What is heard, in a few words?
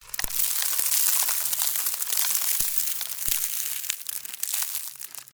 debris